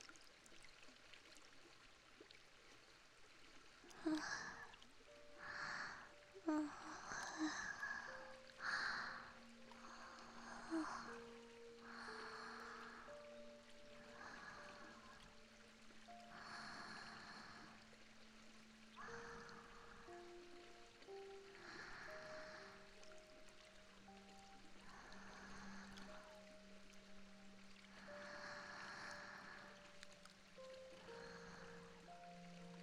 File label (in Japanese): 添い寝; あなたを守ってあげる